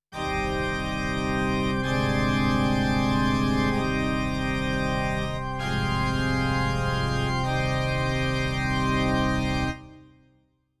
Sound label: Church Theme